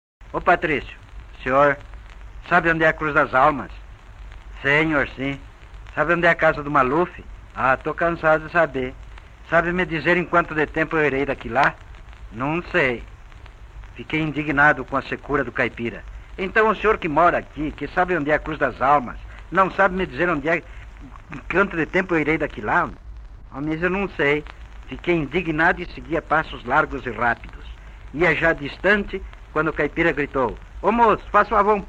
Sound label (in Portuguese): Trecho de Rebatidas de caipiras; São Paulo dos anos 30